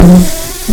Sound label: Lapidarius queens, bumblebees